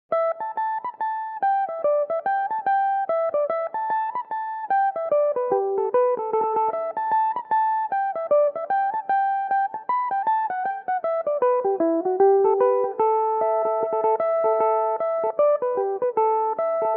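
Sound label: reel A dorian, Irish mandolin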